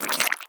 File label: Water Splasher